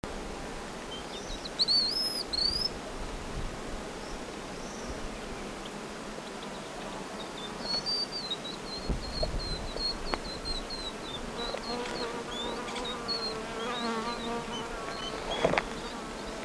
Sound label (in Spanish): tijerilla